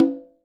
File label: CONGAS; Sonic Reality; Interactive Drum Kits